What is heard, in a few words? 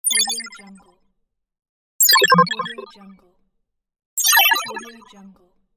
computer chip sounds